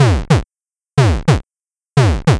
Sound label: Cardiovascular, alarms; J Edworthy 2019